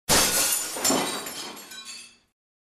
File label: Thick Glass Shattering